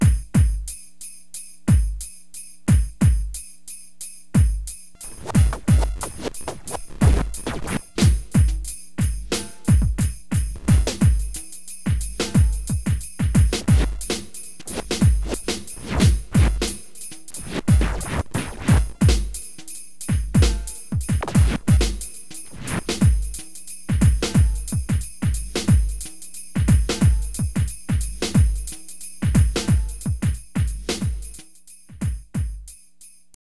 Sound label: beats; sweep